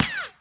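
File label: ricochet; tusken; Star Wars Obi Wan; XBOX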